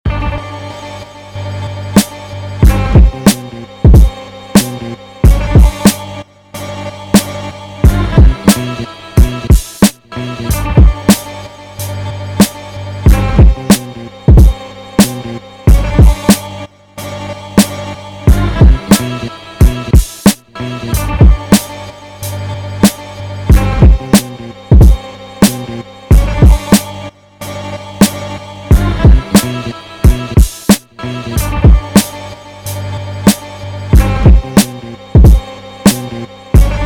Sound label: Beat